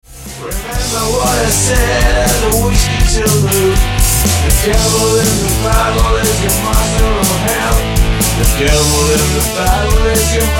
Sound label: whiskey blues